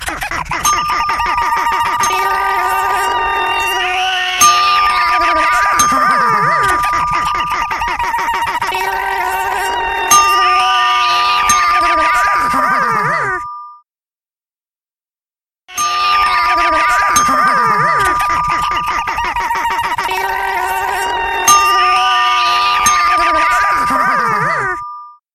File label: Wakeup Alarm